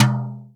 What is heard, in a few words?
Toms